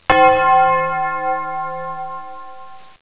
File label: bell